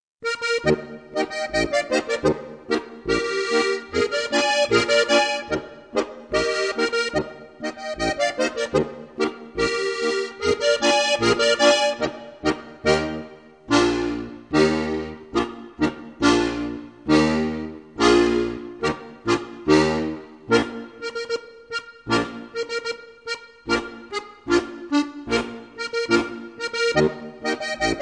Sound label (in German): boarischer